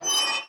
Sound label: metal creak; adams venture origins